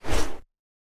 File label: Hard Swing